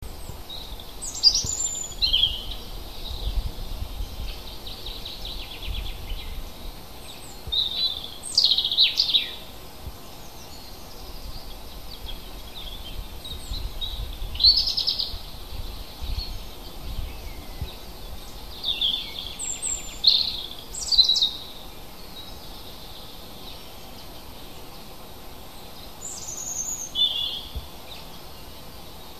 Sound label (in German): vogel heimisch; biologie; wald; april